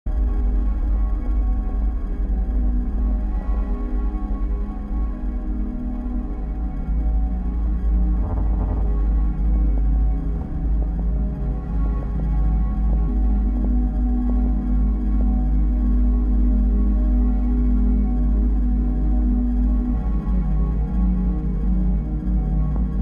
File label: piano